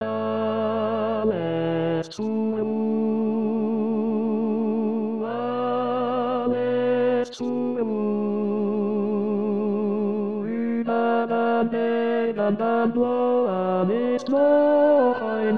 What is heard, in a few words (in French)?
abendlied tenor; f.abt